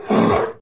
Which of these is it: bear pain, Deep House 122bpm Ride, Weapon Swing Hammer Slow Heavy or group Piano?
bear pain